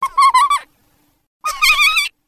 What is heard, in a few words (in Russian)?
ostrij pisklyavij smeh